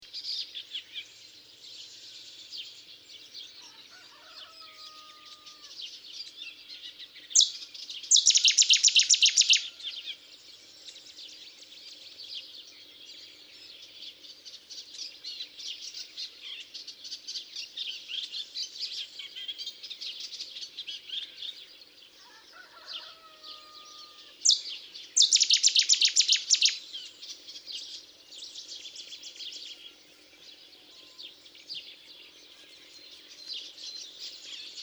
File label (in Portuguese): rouxinol bravo; aves